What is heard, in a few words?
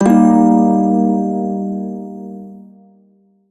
Shawzin Blues Chord; Warframe; Prime Shawzin